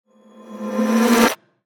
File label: Tiny Riser cinema